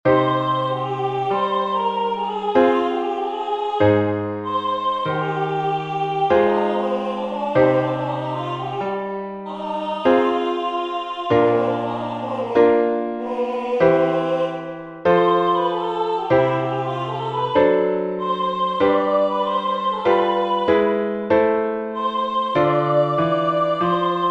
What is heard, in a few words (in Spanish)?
entonacion piano; Entoación con acompañamento